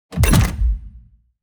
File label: Puzzle strategy game menu ui unlock item